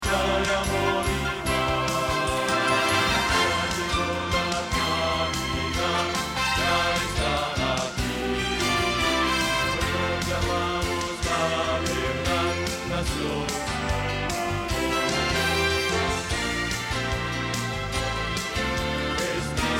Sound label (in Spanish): Bajo